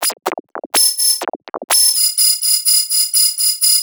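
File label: percussion, Drum Loops 125bpm, Nu Dance Electro; Zero-G; Soundclash Nu Dance Electro; Acidized Wavs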